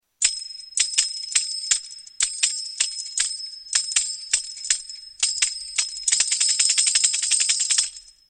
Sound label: zilli masa